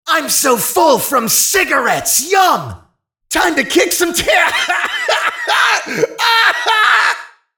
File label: cigarettes sfx; spyro